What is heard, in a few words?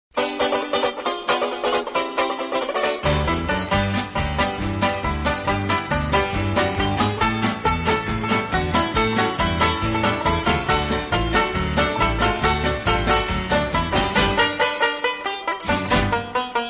banjo